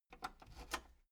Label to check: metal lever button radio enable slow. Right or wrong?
Right